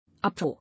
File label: Uproar